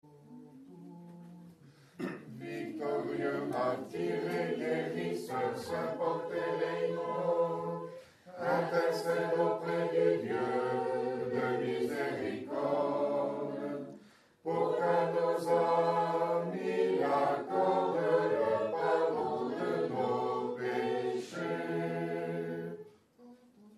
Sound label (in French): Tropaire saint panteleimon ton avant liturgie orthodoxe; ton3